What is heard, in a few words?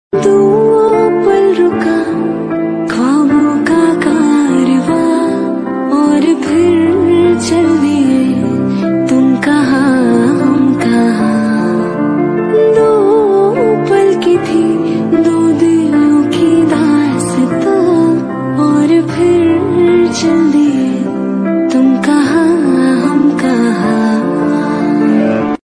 Female Version